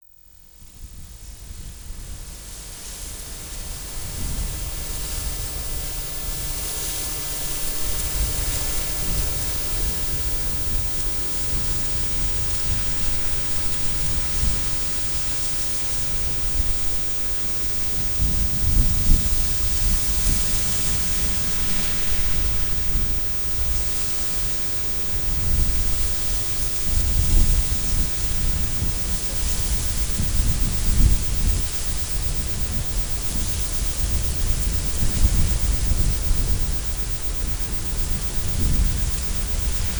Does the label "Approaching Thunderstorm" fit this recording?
Yes